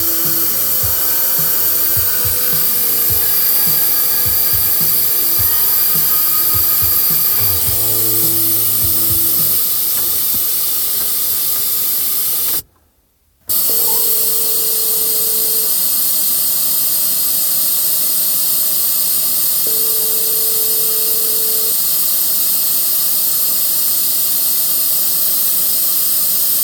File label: samsung s7 audio noise constant; audio issues